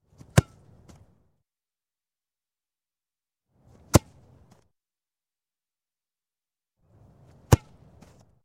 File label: Football Catch Ball; Sound Ideas 2000 Series